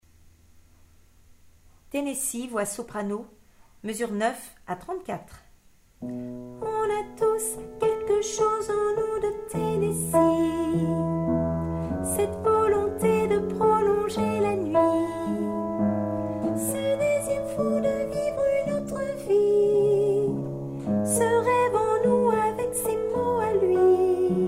soprano

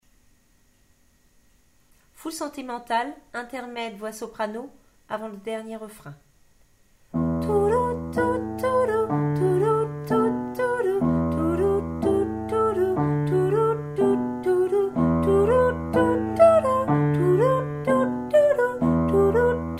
intermede soprano

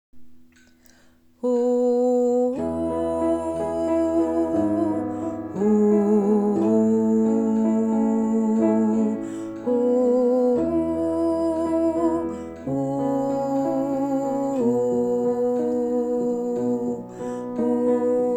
chant d'entree basse

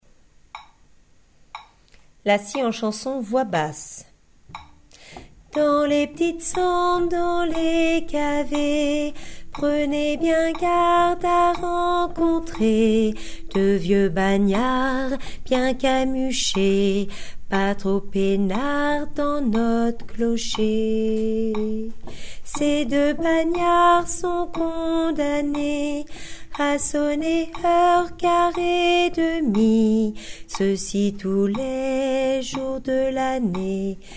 la scie en chansons basse

